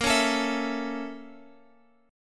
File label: Bb Minor Major 7th Flat 5th, Guitar, Open G; 20,18,19,21,x,x